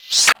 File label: spooky